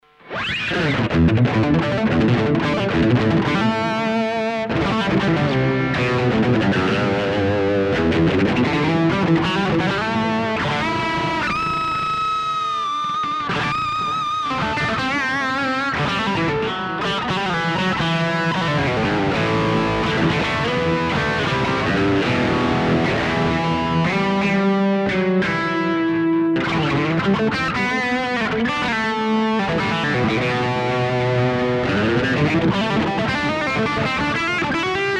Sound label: Blackstar LT Echo 15